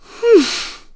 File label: Huff; Yuga huffing (after casting spell on Link); Zelda